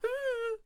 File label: Ice Archer Attack Vocals